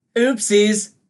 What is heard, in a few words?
LOSE; Pitch Black Audio Pong